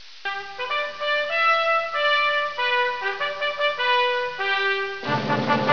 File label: anthem